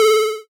organ

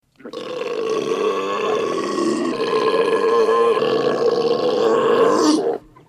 booger burp

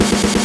drums

